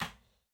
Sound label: Foley (Slice)